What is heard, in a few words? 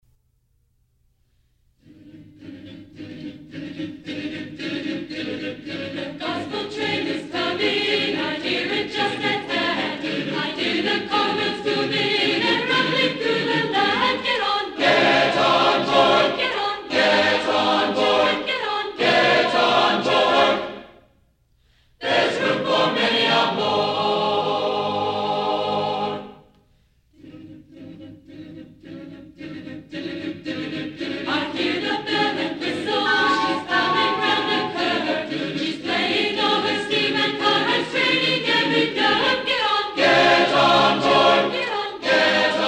Chorus; The Gospel Train